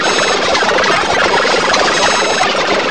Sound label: computer; REDNECK; RAMPAGER